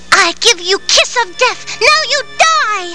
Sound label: kiss; ranma